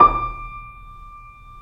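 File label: keys, YC7 piano pp; Rhythm Section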